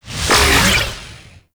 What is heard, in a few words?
Orbital Strike Ability Cast Throw; Warframe; Trapper